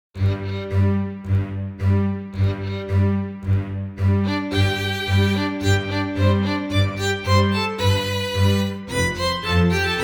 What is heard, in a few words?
Quartet Introduction